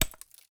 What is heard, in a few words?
sling stone, attack impact